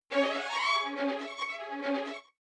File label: string phrase